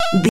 retro damage hurt ouch

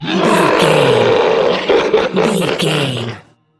troll monster taunt

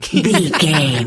voice male laugh

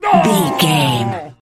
voice male battle shout short

